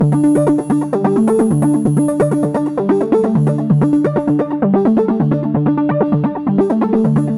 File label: Seq Bytes; Zero-G; Future Wave